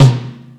Tom Tom, Tom One-Shots; KJ Sawka - Drum n Bass & Beyond; Drum Tool Box

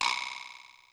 Vibraslap, Yamaha DD-35

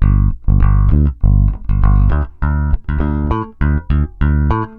bass G, bass baion

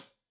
Hat Tight, Hi-Hat; Deeply Sampled Drums

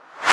CLAP Rev, E-MU Orbit-3

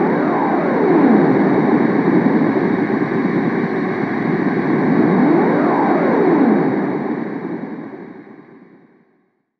odd, Weird Loops, Chillout; Best Service ProSamples vol.36

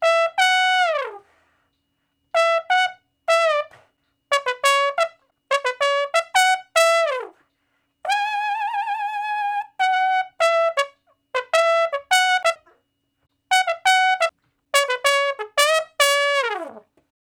Trombone Shuffle (Db); Crimson Blue and Fabulous Horncraft 4 RnB; Horncraft for R&B; Solos

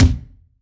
BD, BASS DRUMS; EdgeSounds

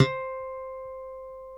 BASS C4 HARM; D-STIK BASS2

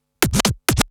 Drum Loop 128BPM Scratch; VEC5 Drumloops; VEC5 Multi Loops 128BPM; Loop Elements

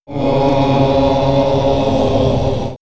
Monks Many Throats; vox mix; X-Static Goldmine

